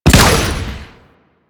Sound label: Chem Strike Nox Rifle Shot 3D